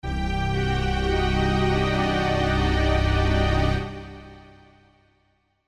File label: musical haunting